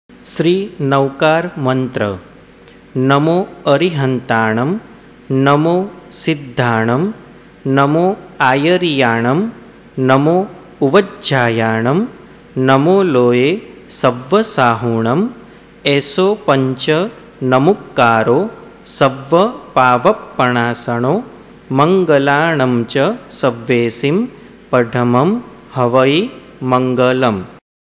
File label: Navkar Mantra